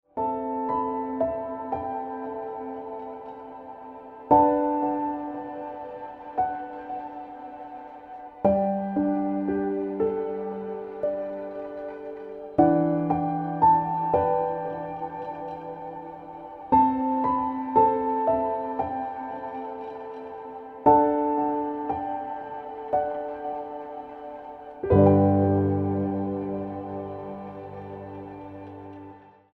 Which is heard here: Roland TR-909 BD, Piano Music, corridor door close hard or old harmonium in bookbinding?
Piano Music